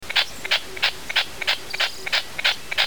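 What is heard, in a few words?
Latham's snipe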